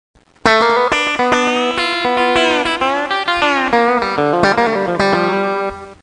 seven bend, guitar

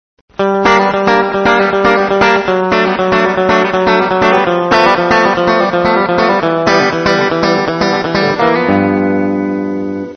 3 chord pull off

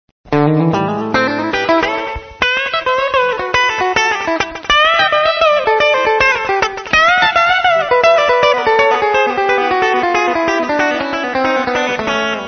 country chop

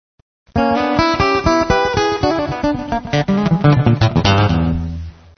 acoustic blues, guitars

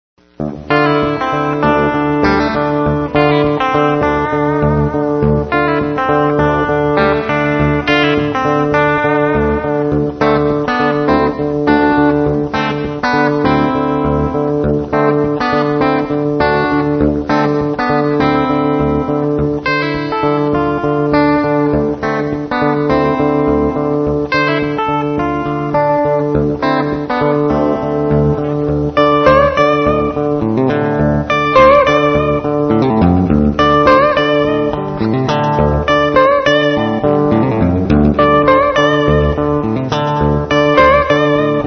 chain, guitar

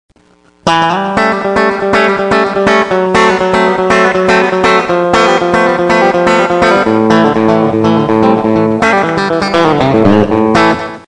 double stop a

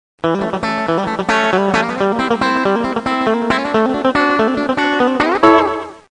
fast double stop, guitars

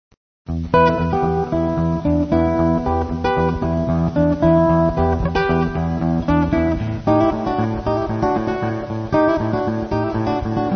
acoustic blues, guitars

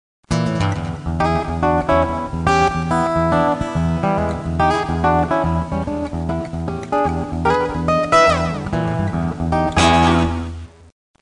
acoustic blues

